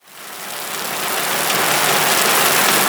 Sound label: sand move; Birthday Party Bash; Wii; Spanish; pirate room sfx